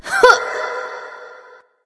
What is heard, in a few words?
grunt jump light; smite; voicepack; hel